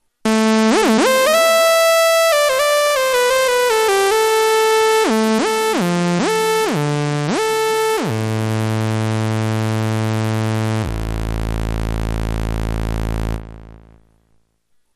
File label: lead vr700